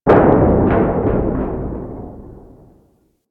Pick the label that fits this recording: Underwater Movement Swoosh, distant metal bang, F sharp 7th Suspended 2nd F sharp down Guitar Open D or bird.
distant metal bang